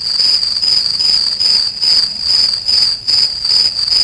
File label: CRICKETS